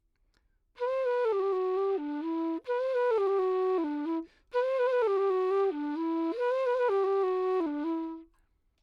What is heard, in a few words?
Dry 4 4 Em 128